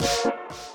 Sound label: TXT Tone; Virgin Mobile USA